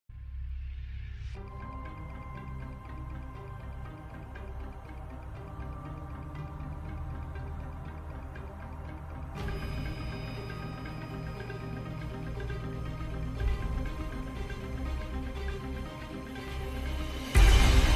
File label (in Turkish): Enstrümantal fon müzik Aksiyon; Kadın